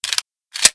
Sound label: usp mag in